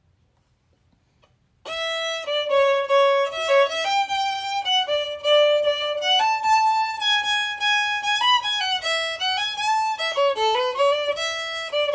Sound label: melody